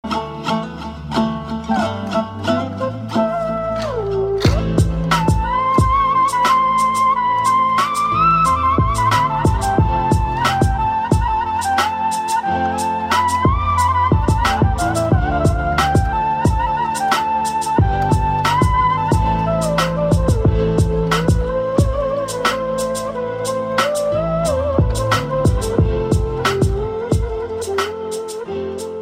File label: Dombra Remix